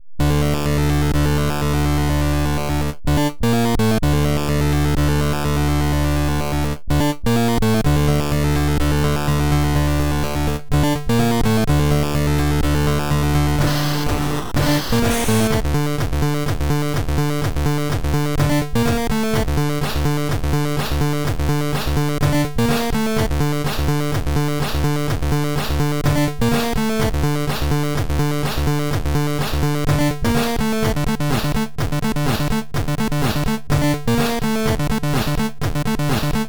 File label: c64